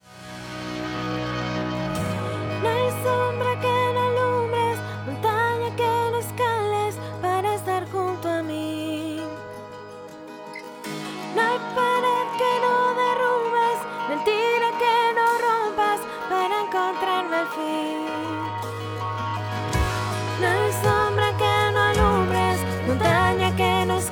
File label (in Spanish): tercera Voz